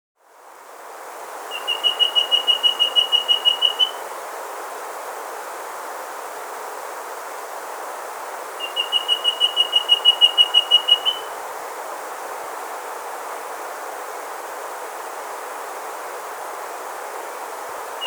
Rusty-Breasted Antpitta (Grallaricula Ferrugineipectus), Andean Birds; S. America